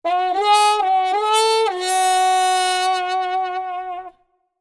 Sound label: Trumpet Sassy Cat